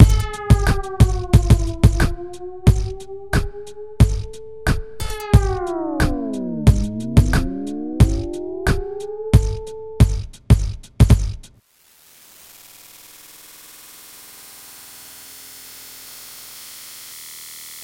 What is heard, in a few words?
electrobox